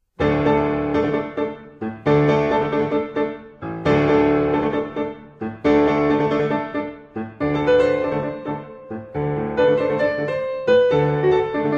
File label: Piano; 1st Mov.; Sora; Allegro con brio